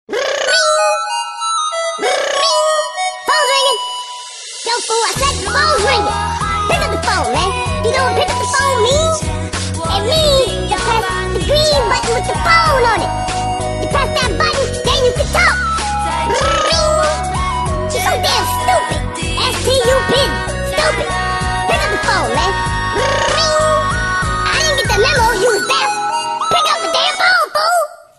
Baby Ringin Nokiamix

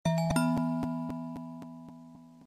Outlook Reminder

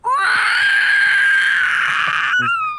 Pause screech

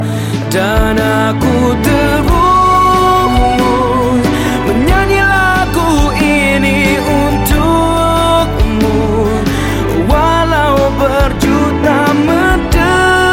Chorus

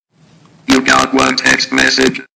Robot Text Msg

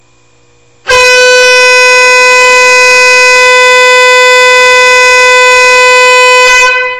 Trucks Long Horn

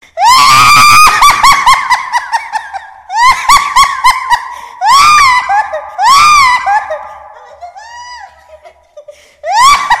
Devils Laugh

